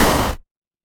retro game explosion

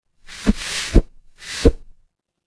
transition swoosh

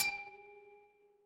glass clink

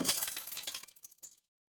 impact crash

